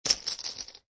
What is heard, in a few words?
Poker Chip Piles Dropping On Playing Cards And Chips; Poker, gambling